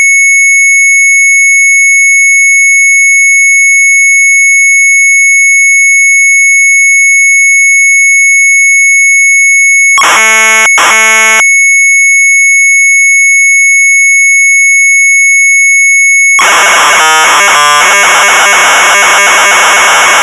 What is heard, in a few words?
MZ800 Tape, cass.; Sharp